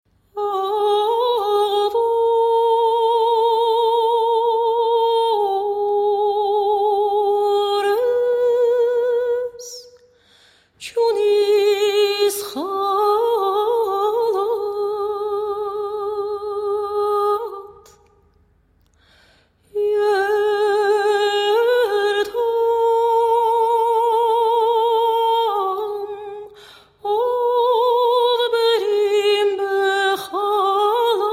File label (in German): wiegenlieder; Akna Oror